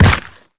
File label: step heavy, zombie blind; Half Life Alyx; creatures